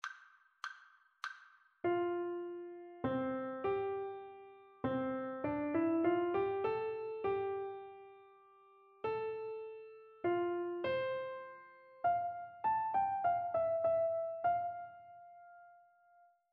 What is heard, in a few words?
melodic dictation; ear training